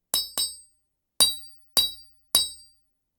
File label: smithing